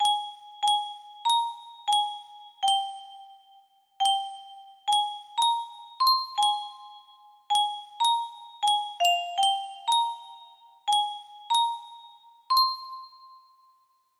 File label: melody